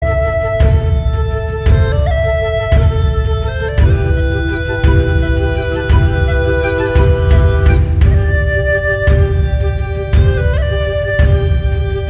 Flute music Ringtone

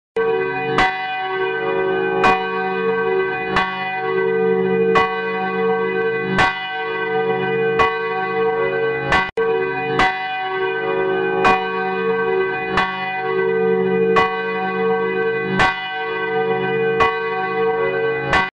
Church Bell ringing sound

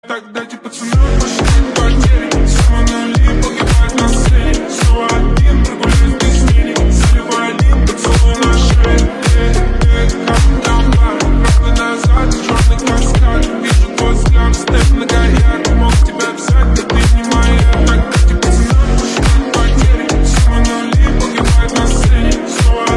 House Music

